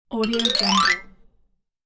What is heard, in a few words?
long xylophone up accent